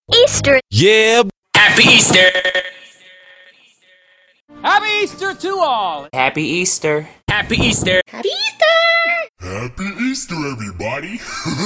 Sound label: happy easter jingles